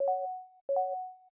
Ring; Windows

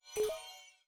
Windows Pop-up Blocked

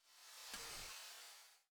Windows Minimize

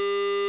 reset; braillenote

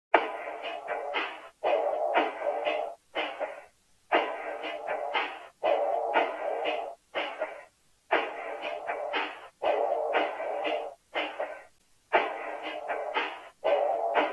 Raw Clock; USA Clock; Millionaire Soundtrack; US Clock (2008)

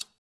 countdown; braillesense

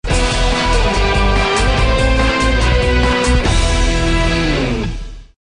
Commercial Out; Millionaire Soundtrack; Shuffle Soundtrack (2010 - 2017)

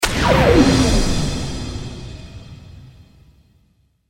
Interrupted Clock; Millionaire Soundtrack

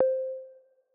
Device Identify; homepod OS

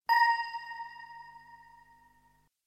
Lifeline Ping; Millionaire Soundtrack; US Revival (2020)

